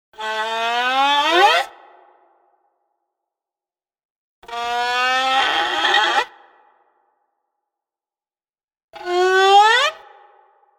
Violin Slides, Various screechy cat like violin phrases; Strings; Musical